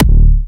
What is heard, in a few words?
Harder D4, Big Room Kicks